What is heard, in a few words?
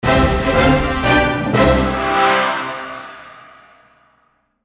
win